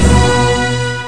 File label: music burst; Sound Effects for Multimedia - Volume 1